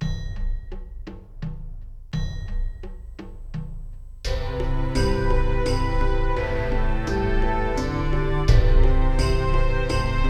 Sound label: rpg village loop